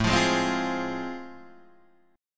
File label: A Minor Major 7th Sharp 5th, Guitar Open D